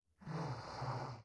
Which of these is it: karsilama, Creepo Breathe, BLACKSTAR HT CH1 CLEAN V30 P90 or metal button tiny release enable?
Creepo Breathe